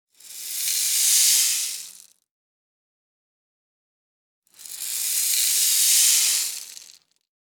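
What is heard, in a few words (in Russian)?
Шипение воды на раскалённой поверхности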